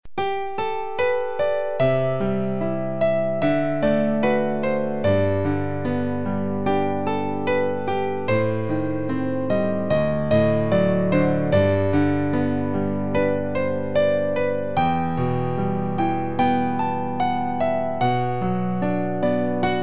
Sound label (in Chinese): 彩铃背景音乐